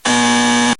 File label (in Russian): zhuzhzhanie dvernogo zvonka